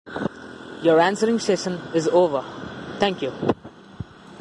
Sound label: session finish